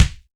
KICK EQ; AKAI S6000 CD-ROM - Volume 1; DRUM RYTHM; KICK&SNARE

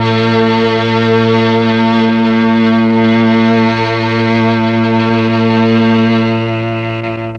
MEL STRING; ILIO; Propeller Island Legendary M400

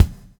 BUBBLE; Spectrasonics - Backbeat

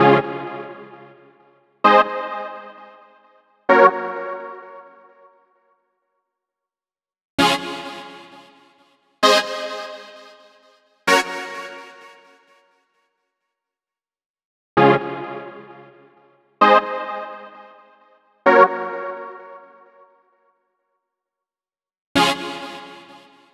Pad Chord, EDM; Sonic Foundry (Sony Creative Software); Afterhours EDM; Ibiza Underground

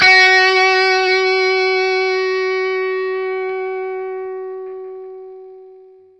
SINGLE FS4, Vintage Blues Guitar, ELEC GTR; USB Soundscan vol.22

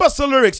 VOX SHORTS; Megabass Remix! 2

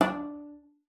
CELLO FINGER D4; Xsample VOL-5

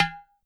Udu Finger, YAMAHA RM1X

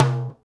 Timbales, Latin; Roland L-CD701; PRC Latin 3

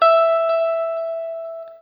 NOODLE LONG, Guitar & Bass; Quantum Leap; 90's Sample CDs

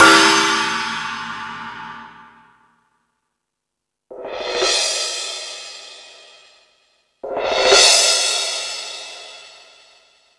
Crash 2, drum machine

